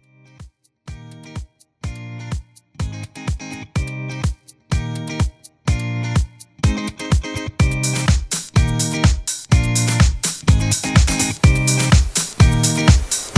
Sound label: Summer Pop Uplifting